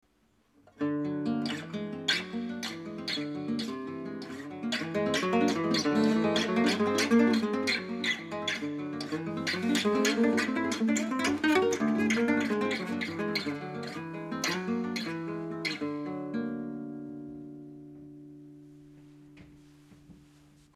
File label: Transformation music